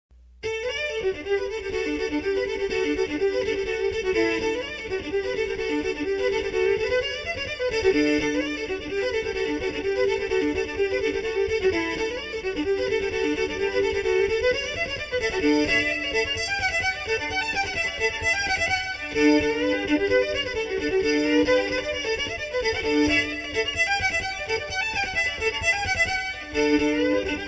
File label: solo fishers horn